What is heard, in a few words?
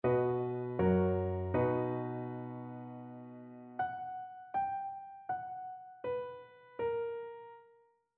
melodic fragments